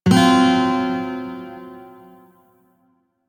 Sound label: Shawzin Hirajoshi Chord G; Warframe; Lotus Shawzin